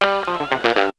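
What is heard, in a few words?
guitar riff; BEAT2000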